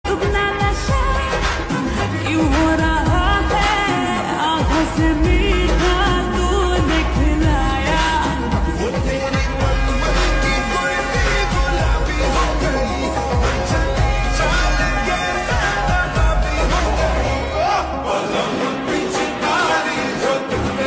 Slowed Reverb

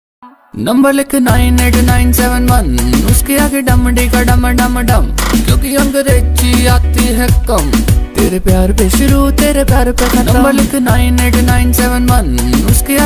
ringtone punjabi

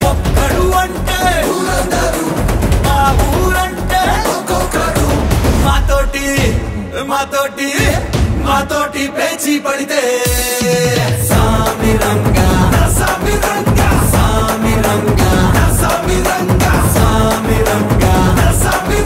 Title Song Ringtone

